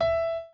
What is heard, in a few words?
piano chord